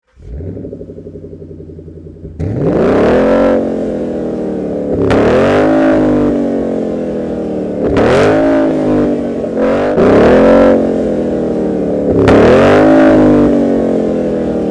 exhaust system; borla; atak

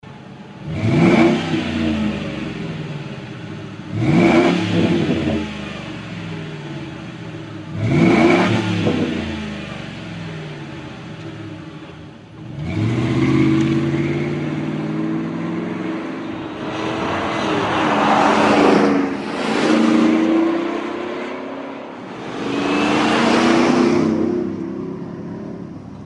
force 2 series exhaust system mild sound; flowmaster

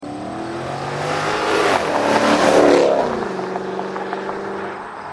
drive by, exhaust systems; corsa

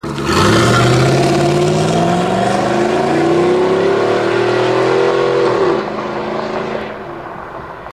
gm truck sport exterior launch, exhaust systems; corsa

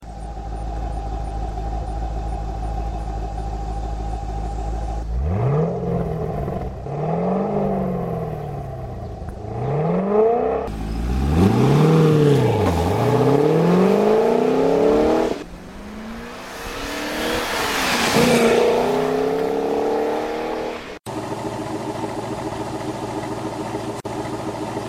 touring truck exhaust system sound; corsa

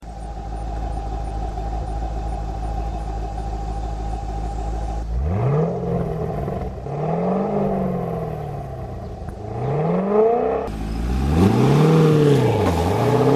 sport exhaust system sound; corsa

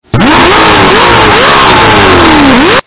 c6 touring exterior revs, exhaust systems; corsa

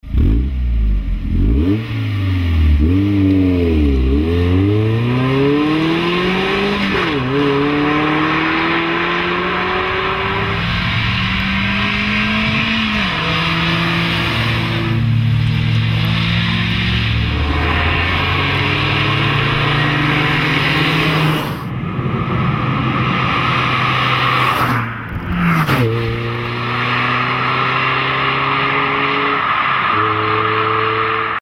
60 series delta flow mufflers moderate sound; flowmaster

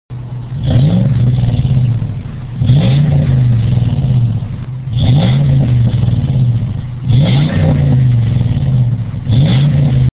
dodge dakota 47l, exhaust systems; gibson

